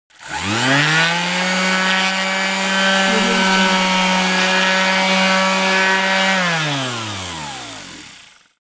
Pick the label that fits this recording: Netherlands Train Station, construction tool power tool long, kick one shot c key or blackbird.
construction tool power tool long